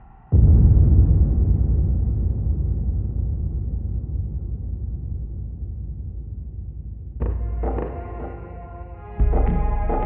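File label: blood ambience; quakerepo fortress lustmord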